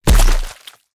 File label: body hit finisher